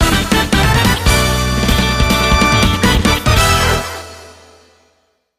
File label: Music event; Jikkyou Powerful Pro Yakyuu Success Special; PS Vita